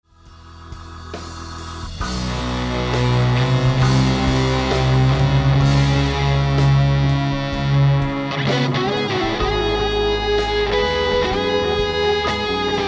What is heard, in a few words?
echoes solo; POMPEII T1